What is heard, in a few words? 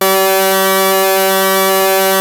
ARP 2600 D4, synthesizer; Hollywood Edge - Giorgio Moroder Rare Synthesizer Collection